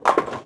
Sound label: bowling pin hit; bouncyobjects; Tony Hawk's Project 8; XBOX